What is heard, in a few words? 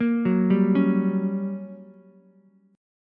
G Minor Major 7th Flat 5th, G, down, Ukulele; arpeggiated; JGuitar